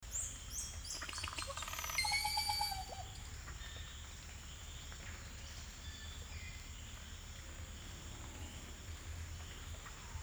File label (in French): cassique hupe, Guyane; La puissance solitaire (5-6 cm) de la rainette kunawalu (joliment appelée crapaud narcissique par mes hôtes car elle fait « Moa Moa »)